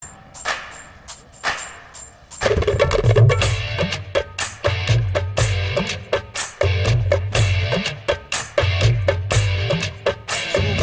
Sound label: Hindi Song Ringtone; Fanaa